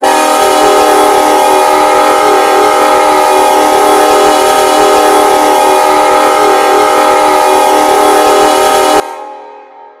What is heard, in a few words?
k5lla, horn; run 8 v3 horn pack; bnsf